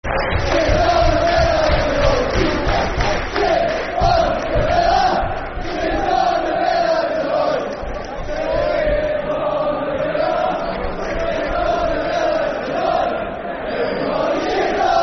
Sit On The Villa, Football Chants; Birmingham City Bcfc

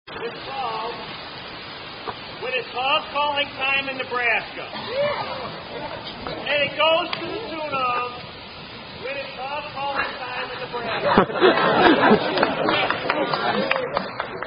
Hog Calling Time In Nebraska, Sung By Boy Scouts

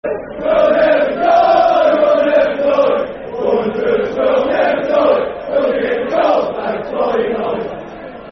We'll Never Die, Football Chants; Wolves; wolverhampton wanderers; fanchants

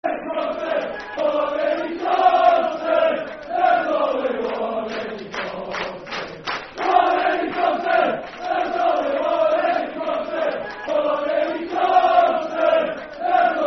Football Chant; One Eddie Thompson; Dundee United Dufc

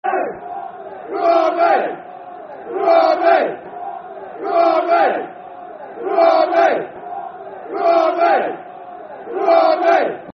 Football Chant, Soccer Song; Blue Army; Wycombe Wanderers Wwfc; fanchants

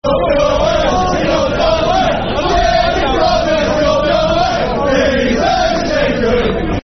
Jimmy Provett's Got No Hair Football Chant; Bury; Bury Bfc

